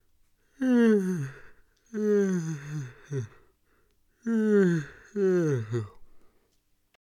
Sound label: Male Yawn Various